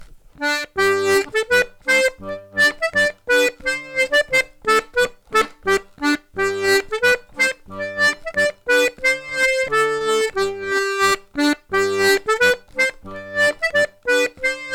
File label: polka